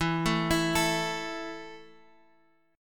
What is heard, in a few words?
A 5th E, guitar chord